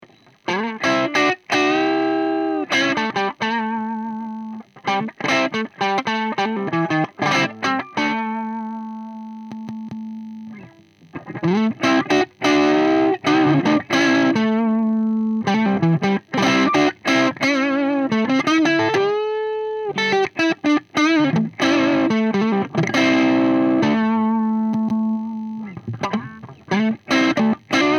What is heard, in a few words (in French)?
SH2 pentode, Canal 1, SH2 position manche, EF86 en pentode, satu de preamp; WJ Voxy; ampli White Jack, un 3 canaux